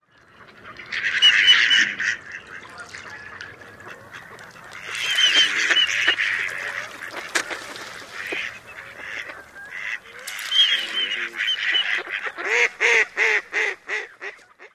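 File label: male courtship whistles, Mallard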